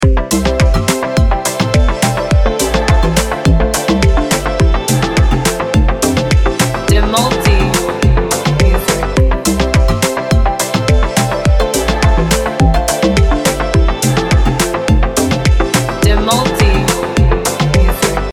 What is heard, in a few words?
instrumental edm; Dentuman elektroniknya tropis, ringan; Bayangkan sore di pantai dengan sunset dan minuman dingin, itulah rasa yang dibawa track ini.